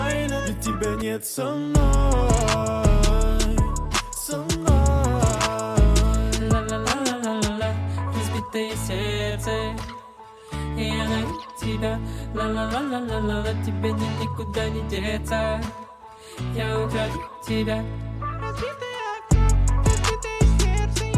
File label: rap ring